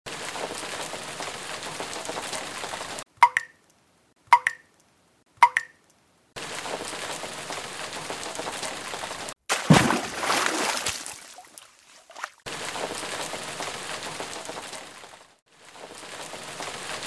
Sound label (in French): circuit sonore